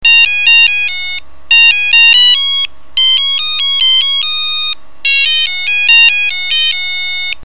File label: ringtone; motor repton